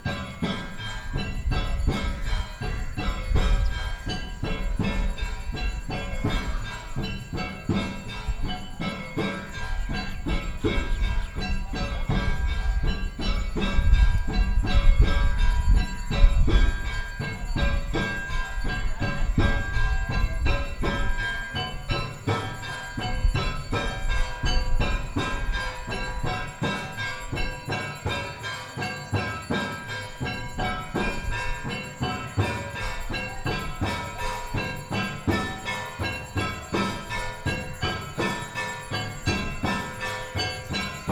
Drums and Bells Above Jatarghat Varanasi, Religious Music; Selected Field Recordings from India and Nepal Volume II